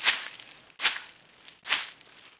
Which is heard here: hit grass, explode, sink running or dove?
hit grass